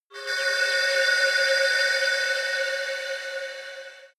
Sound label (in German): shot shining glance, sweeps und swells; comedy-cartoon; soundpool